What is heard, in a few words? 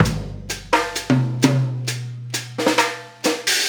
Trap Kit, Drums; Sonic Foundry (Sony Creative Software) - Essential Percussion